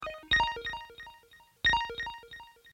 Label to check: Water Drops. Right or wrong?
Right